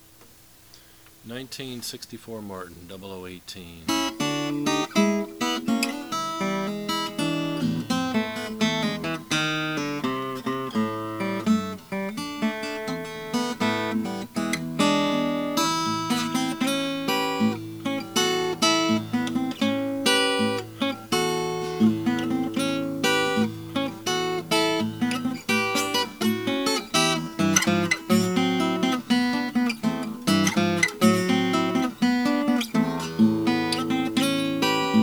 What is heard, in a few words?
martin 00 18 natural